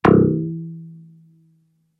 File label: contact mic